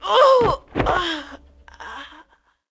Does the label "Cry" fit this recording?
Yes